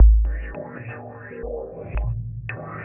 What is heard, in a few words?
Riff Raff Sub; Sonic Foundry (Sony Creative Software); Ma Ja Le Ethereal Textures